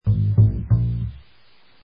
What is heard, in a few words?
sneak